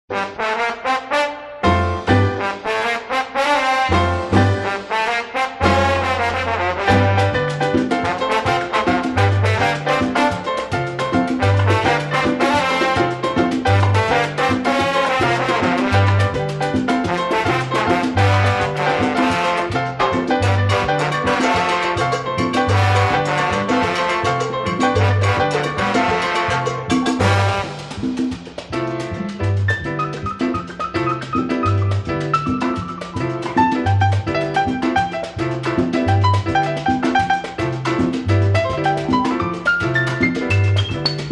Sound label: jazzy